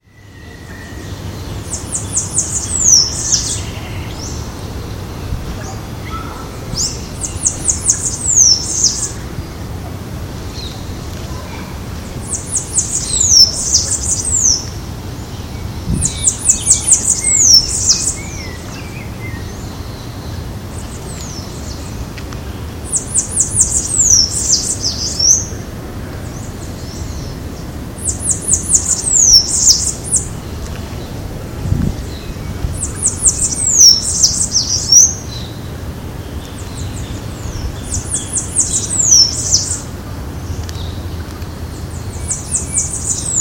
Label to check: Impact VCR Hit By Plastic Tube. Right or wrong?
Wrong